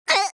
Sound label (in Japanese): うぅ！(ダメージ); バトル系